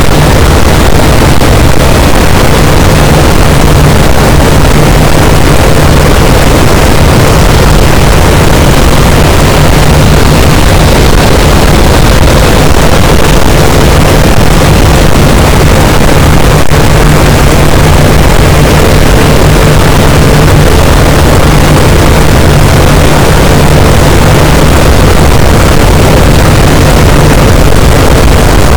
cachalot, boat; Physeter Macrocephalus

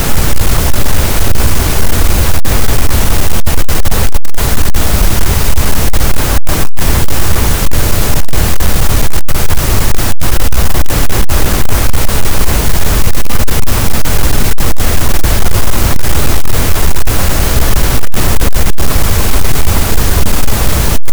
cachalot, Physeter Macrocephalus, gaussian noise

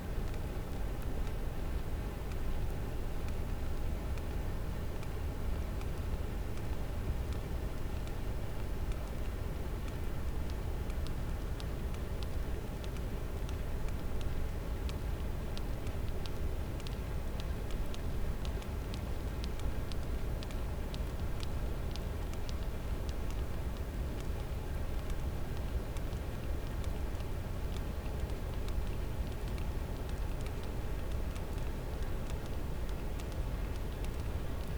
cachalot positif, Physeter Macrocephalus

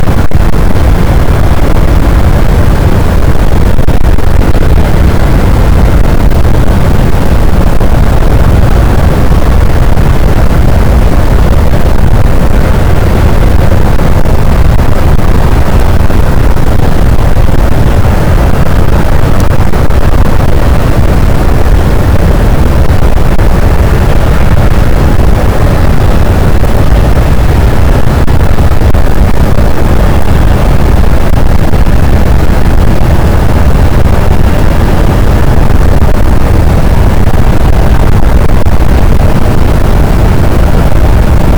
cachalot positif, boat, Physeter Macrocephalus

